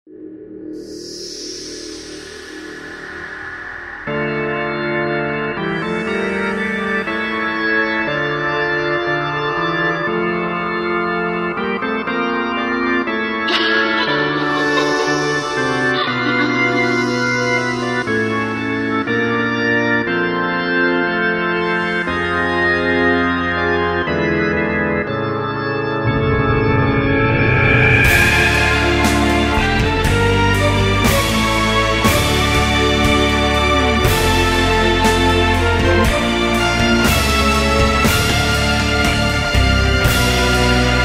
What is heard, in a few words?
organ